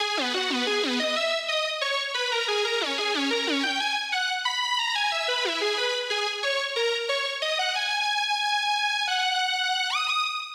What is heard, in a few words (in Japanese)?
Lead, Vital, これ一つだと音色は意外と大人しいですね。; シンセ; リード; リードレイヤー①：Vital; ”Evans Lead”